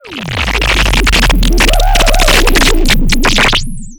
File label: weird sound; geonkick